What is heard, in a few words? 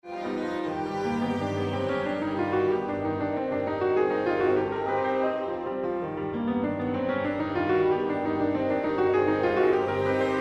Rachmaninoff Piano Concerto 2